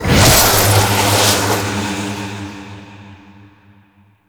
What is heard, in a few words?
Impact; War Pact; Warframe; Priest